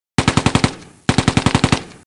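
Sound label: machine gun MG34 double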